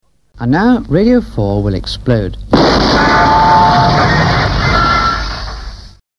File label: Jingles